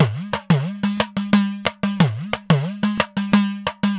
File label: 3 4 Pattern